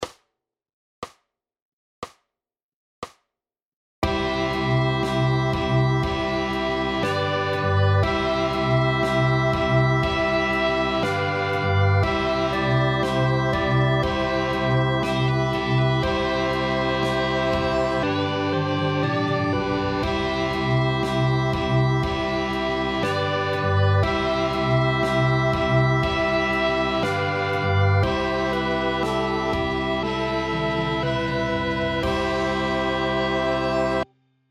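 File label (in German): für Geige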